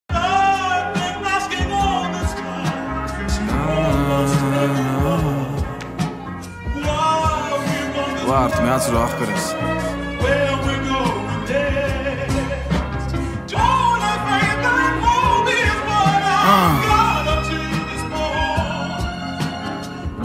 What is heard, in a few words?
Armenian Trap